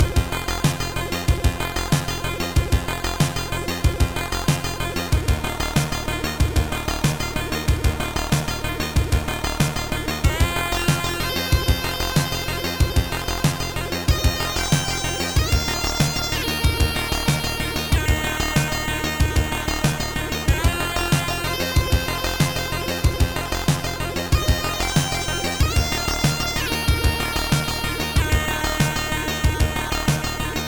chiptune; Trackers; Modland; chiptune no3

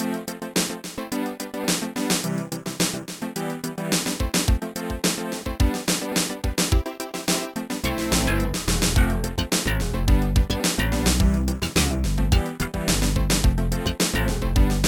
bass in space; Trackers; Modland

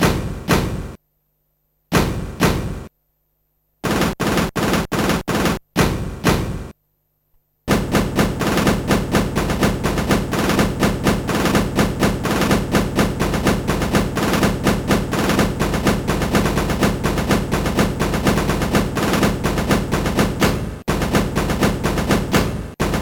drums; Trackers; Modland; Richard Joseph

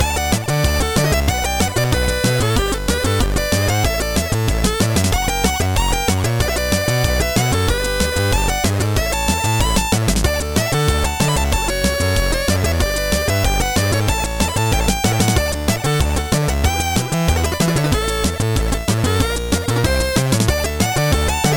mod synth; Flash (PL); Trackers; Modland

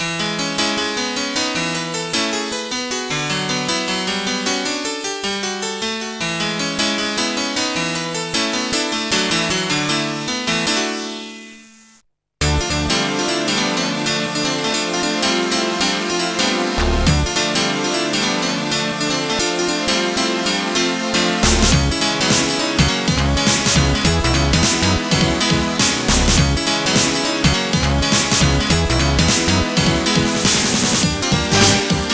the final piano song; MED; JaDe

